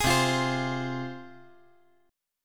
C Suspended 4th Sharp 5th, C, down, chord

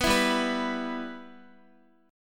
G 6th, Guitar; G6 strummed

